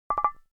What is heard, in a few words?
ui button glass denial